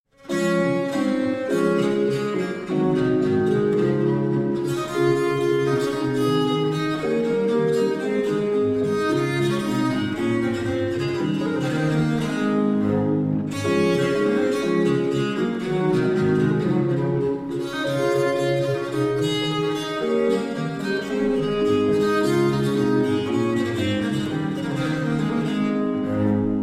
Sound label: Marsch Sonata in D Major, Viola da Gamba; Ernst Stolz; German Viola da Gamba Sonatas; Wiesentheid collection; 1722; Anonymous